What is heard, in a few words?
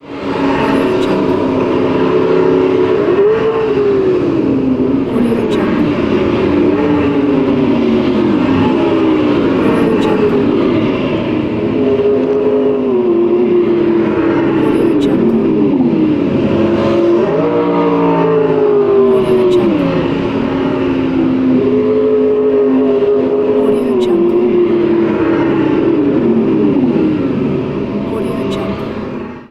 racetrack ambience